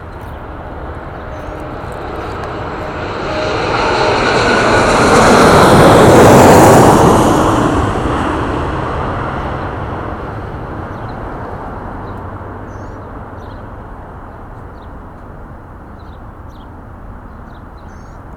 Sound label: LGA flight path over queens